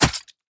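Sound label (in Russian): проникновение мачете в самую плоть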